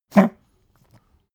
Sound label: pug gag smack medium; natur-tiere; hunde